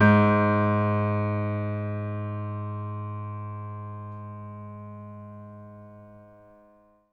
MIX R, Acoustic Piano; InVision Interactive; Miroslav Vitous

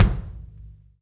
kick, Interactive Drum Kits; Sonic Reality

